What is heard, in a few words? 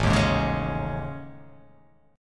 A Major 7th Flat 5th, down